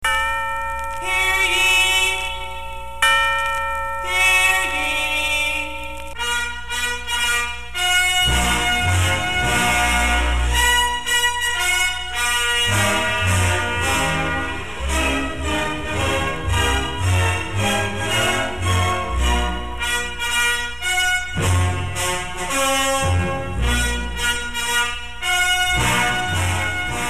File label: Town Hall theme; WONN